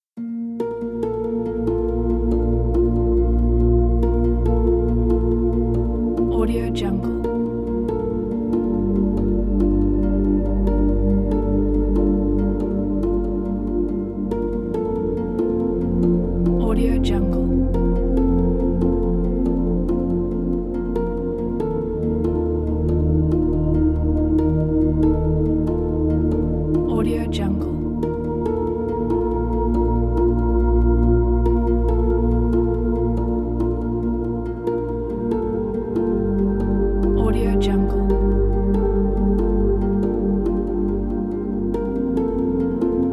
meditation background music

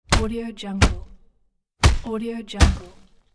hit the ground

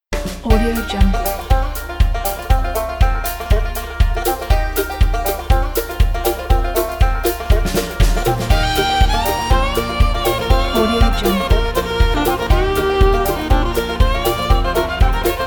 the cheerful united states country

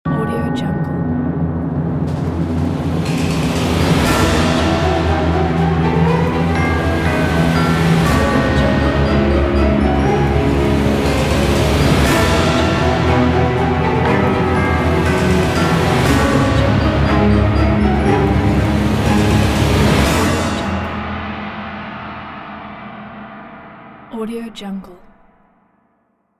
halloween dark spooky logo; sviridpavlov

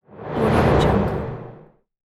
whoosh

